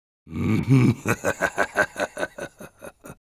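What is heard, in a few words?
laugh evil, Spanish; Medic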